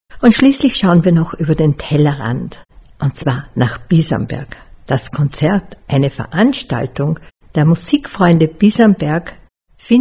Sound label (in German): Symphonic Brass Trio Stimme Rhythmus; Sonntag 24.09. Bisamberg